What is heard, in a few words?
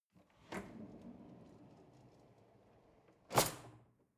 drawer cutlery open draw